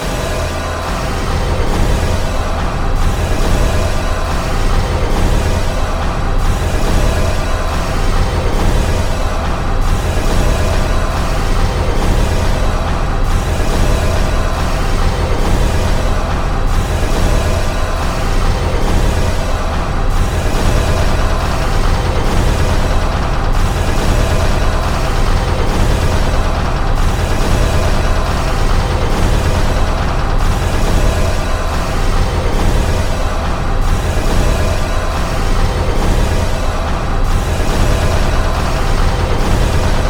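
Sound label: sewer theme, music; cof; slender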